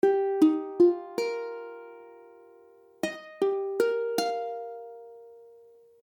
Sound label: Ebadd9, ukulele; ukulele akordy